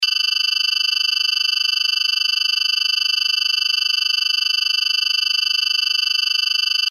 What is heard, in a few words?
Different Alarm Tone Phone